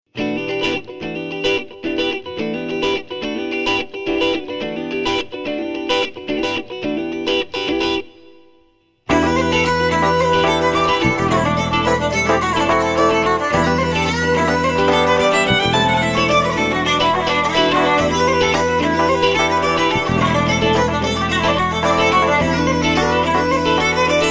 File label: irish goove